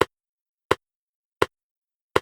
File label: clapping